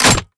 Mag In; Valorant; judge

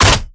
Mag Out; Valorant; judge

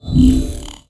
inspect energy, weapons; cstrike; valorant; singurality phantom

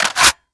mag in; Valorant; phantom

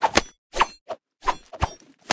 Inspect; cstrike; valorant; rgx karambit

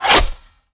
magazine in; Valorant; operator

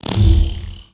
inspect energy, weapons; cstrike; valorant; singurality phantom

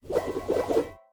twirl; cstrike; valorant; neofrontier sheriff

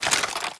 reload mvt; Valorant; operator

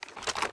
inspect, weapons; Valorant; bucky

